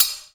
BELL JPN, JAPANESE PERCUSSION

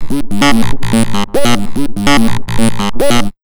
AMBIENT 147 SYN, Big Beat Drumloops

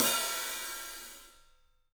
PAISTE HAT, Drums; 3D Sound

